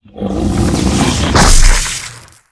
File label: stone attack, monster